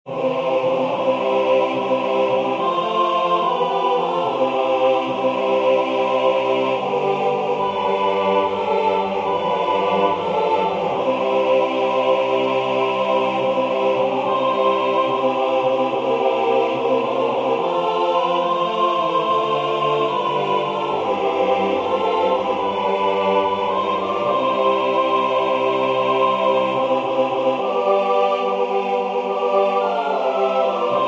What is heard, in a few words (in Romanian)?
midi cor